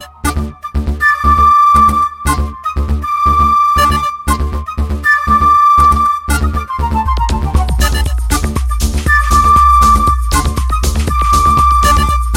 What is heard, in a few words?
Pipe electro